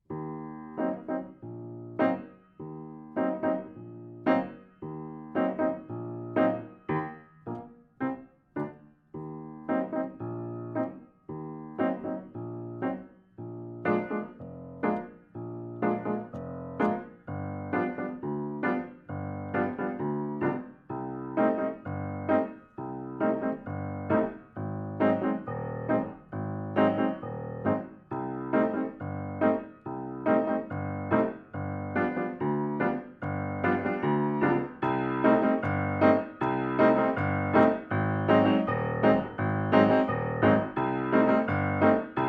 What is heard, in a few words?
Modern Woman Instrumental; theater